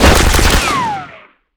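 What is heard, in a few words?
machine gun Cloth, Damage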